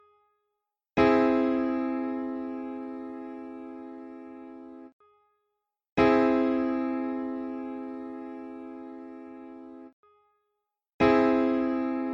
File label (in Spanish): Tríada aumentada